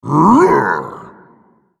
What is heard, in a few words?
spellcast effort; Hecarim